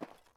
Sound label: mud walk, step